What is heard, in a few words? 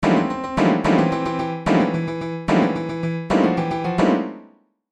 midi